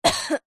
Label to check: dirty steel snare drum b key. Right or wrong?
Wrong